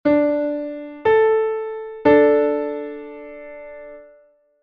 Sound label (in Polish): kwinta d1 a1; konsonans i dysonans